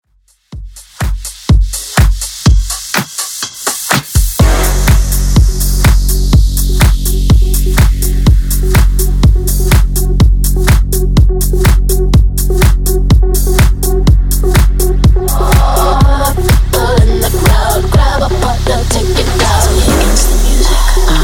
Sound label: Bass House Dancemix 124bpm